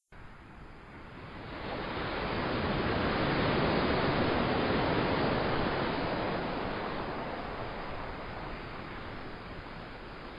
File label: sounds of nature gentle ocean waves no music